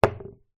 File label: food drink empty take away coffee cup down on surface